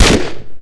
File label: Revolver Fire; Playable Tutorial Level